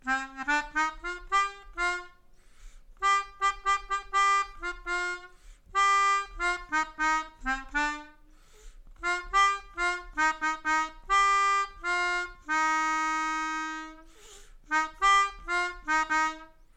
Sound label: Melodica